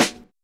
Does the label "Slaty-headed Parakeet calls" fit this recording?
No